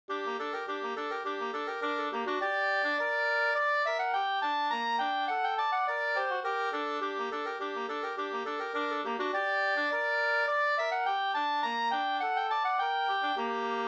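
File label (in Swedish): slangpolska, two-part; Norrland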